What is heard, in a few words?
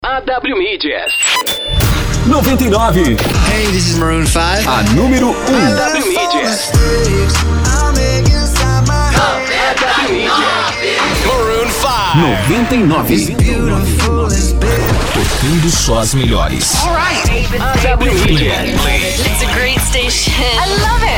intro music